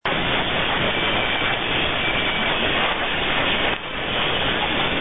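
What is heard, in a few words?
interesting music, radio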